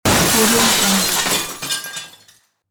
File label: heavily breaking window